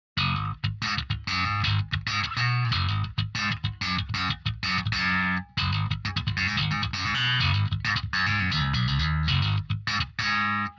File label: bass wet